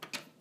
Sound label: door open